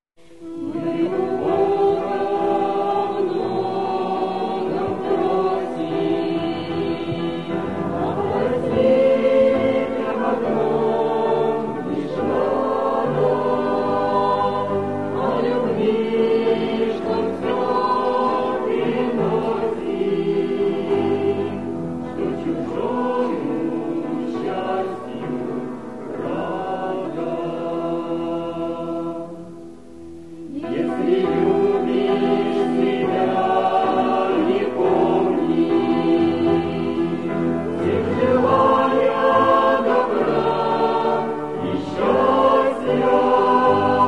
Пение (Мы у Бога о многом); Молодежное Абакан июнь

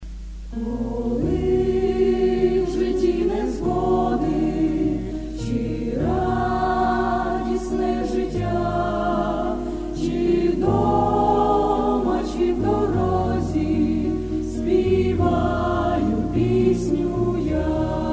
penie; Krasnodar youth camp

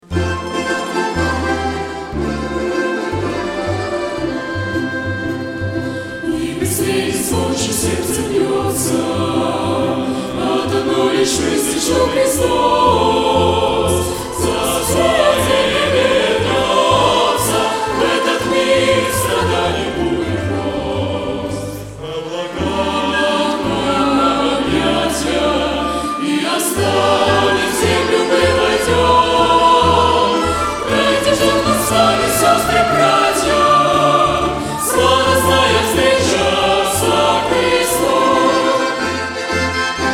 орк; Конференция по благовестию

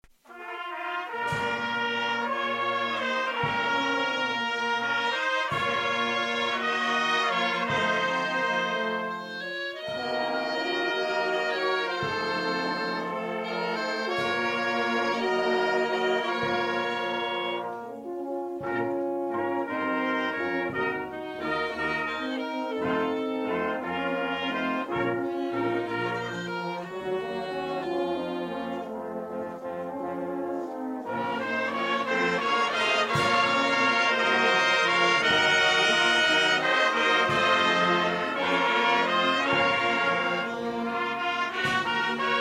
Duhovoy orkestr; Похороны Я.Г. Скорнякова

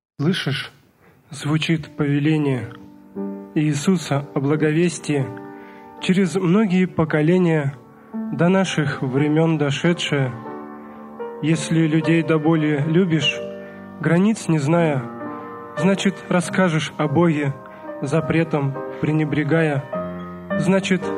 Стих и пение; Миссионерская конференция

